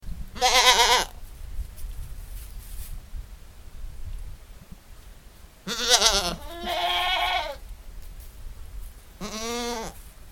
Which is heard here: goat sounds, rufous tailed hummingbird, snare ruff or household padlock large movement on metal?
goat sounds